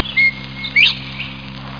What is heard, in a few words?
BOBWHITE